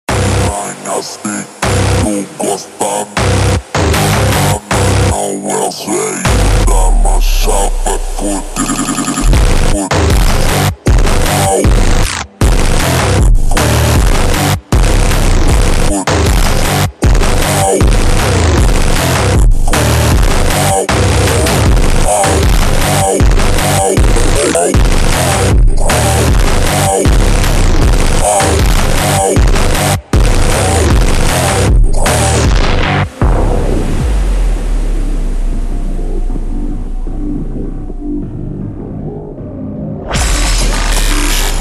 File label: ultra Slowed, funk